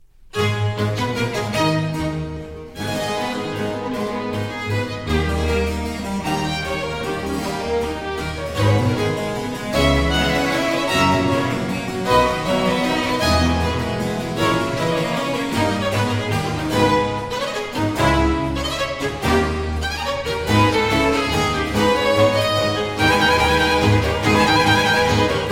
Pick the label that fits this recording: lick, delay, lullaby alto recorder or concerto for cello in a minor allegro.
concerto for cello in a minor allegro